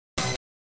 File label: pickup tone